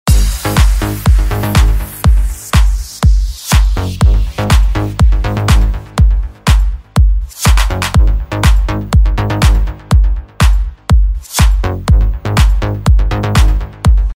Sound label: apple ringtone